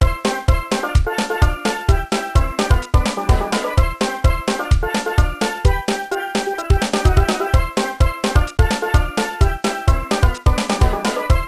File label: three beach tune; DKP2001